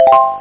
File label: Shield; Ultimate Games No. 2; Amiga-CD32-CDTV; dr strange